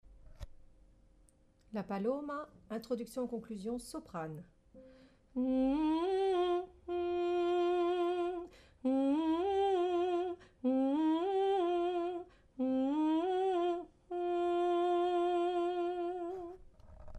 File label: paloma Soprano